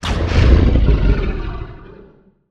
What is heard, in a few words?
Feline Short With Tail, Monster Sounds; TheDeclineOfWarriors; Monster Sounds Volume II